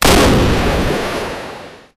bomb exploding in ocean

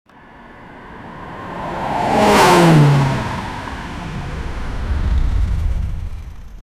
car crash with a big; collisions

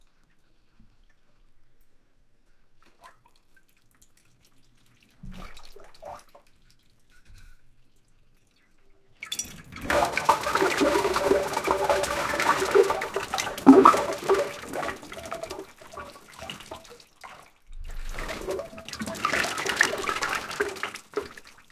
diarrhea bucket, gross

